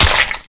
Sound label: bullsquid spit hit; hl2